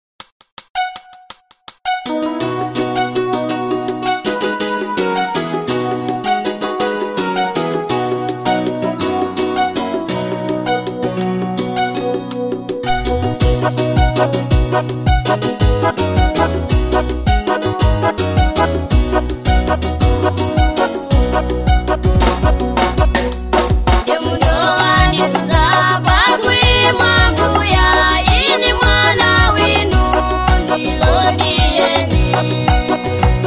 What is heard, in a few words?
choir